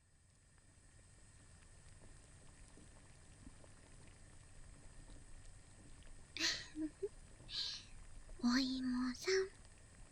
お耳舐め; 高貴なツノガミ様のほかほかおマンコと繋がったまま、幸せにとろけ合う共寝; おっぱい抱っこ; 心とお耳のまっさーじじゃ

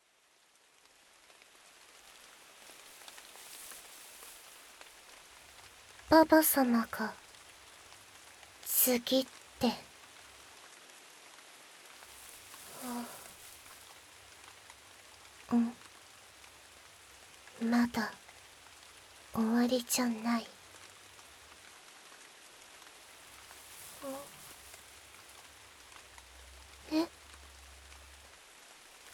雨降り, 環境音; ASMR; 杜の神と傀儡少女; 環境音vol下げバージョン; 雨降りバージョン

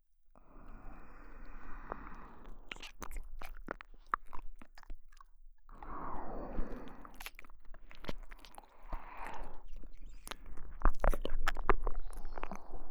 両耳舐めオンリー; ASMR

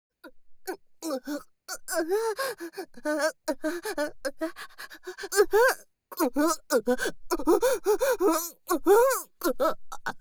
オホ声（SEなし）娇喘

